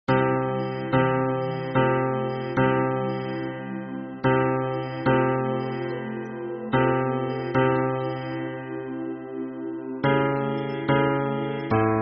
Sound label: hymn bass